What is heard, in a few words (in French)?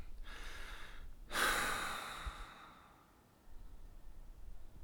soupir; Index des soupirs 40 – Hadjithomas Joreige